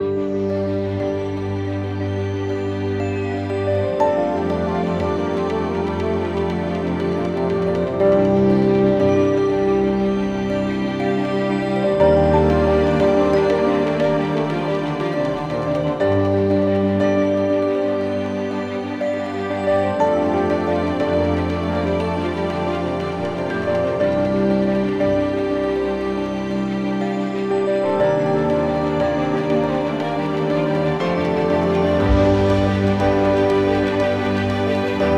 Ambient

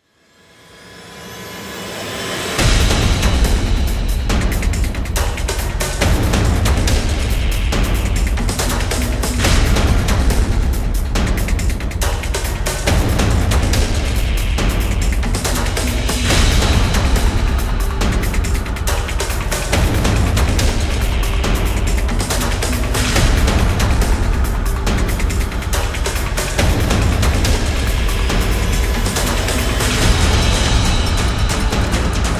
High Alert (Percussion); Fired Earth Music

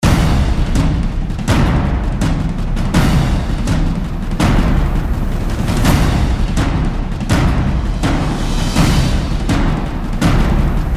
Drums Only Version

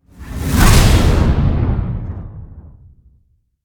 Wiper Hit Swell; Ninja Tracks

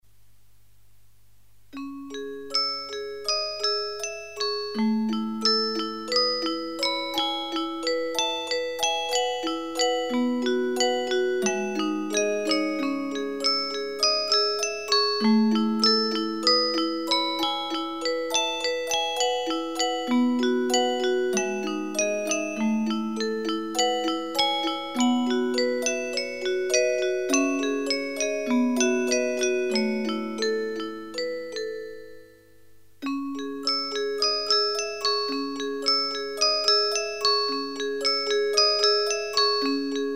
Musicbox

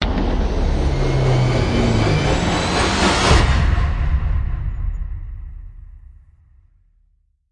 Hit Swo Falling (D); Brand X Music; Brand X Music Catalogue - Editor's Essentials

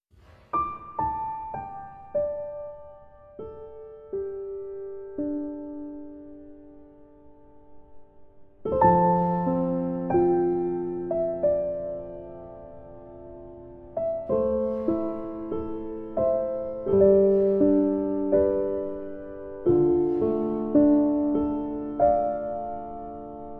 Raindrops, Sad Piano Songs

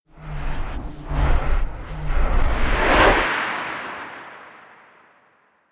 Sting Breakout; Brand X Music; Brand X Music Catalogue - Editor's Essentials

